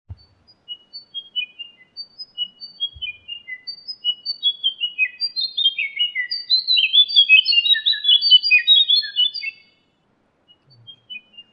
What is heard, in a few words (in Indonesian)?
suara cingcoang alisputih